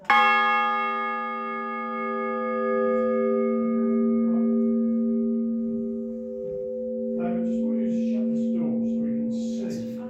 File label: horsham, bells